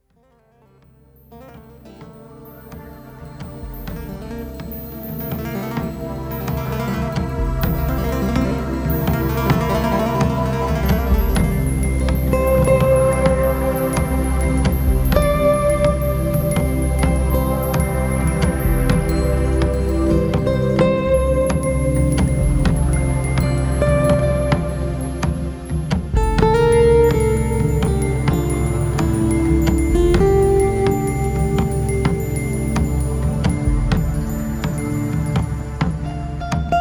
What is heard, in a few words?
Instrumental Peace Reprise